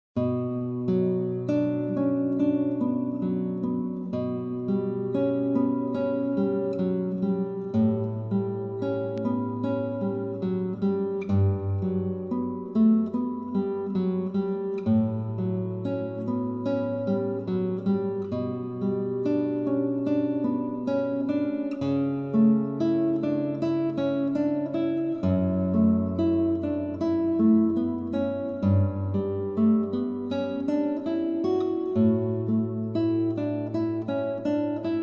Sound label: GUITAR VERSION